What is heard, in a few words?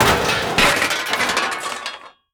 impacts; World of Tanks